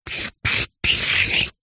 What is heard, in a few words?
office pen highlighter draw shape on paper on cardboard